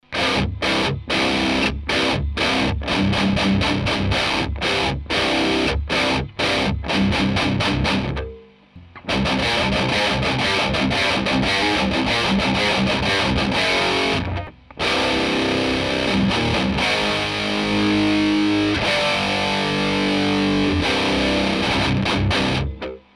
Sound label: oct mod